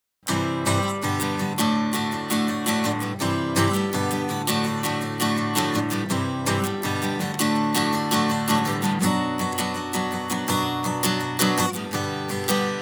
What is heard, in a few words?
Acoustic Far